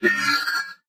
robot synth; Source Engine; Portal 2; robot parts

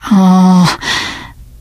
negative noise, teen girl, survivor voice; source engine; left 4 dead 2

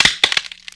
wood strain; physics; Source Engine; cstrike

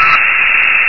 active, turret; source engine; hl1; video games

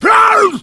hunter shred, attack voice

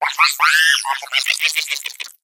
egg devil laugh

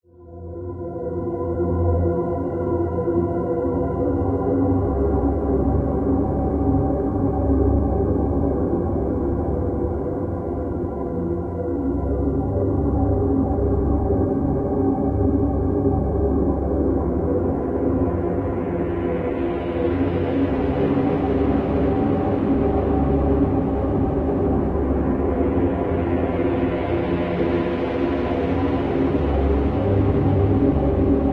song ambient; video games; music; source engine; ep2

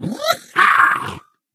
ballbot fist pump

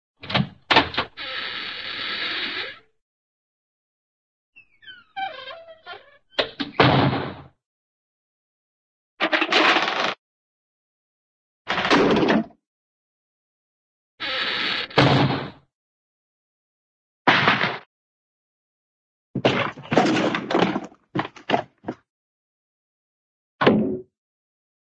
door, wood squeaky open; cartoon

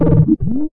dog phrase; source engine; episodic

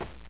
step, hgrunt; Source Engine; bshift

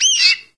single bird; ambient; random animals; Left 4 Dead 2; Source Engine

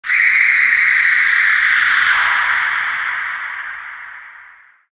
female scream, halloween; Source Engine; tf

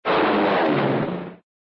zoom, broom; cartoon; winnie witch's broom; hannah-barbera

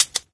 bazooka reload shove home; weapons; source engine; dod

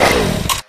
taunt moped end engine off; video games; source engine; tf

